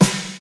Eighties Noisy Punk Snare F# Key, snare sound tuned to the F# note